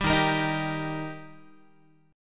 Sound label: Eb Major Eb Guitar; strummed; JGuitar